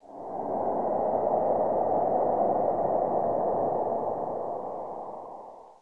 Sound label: stinger; slender skinwalker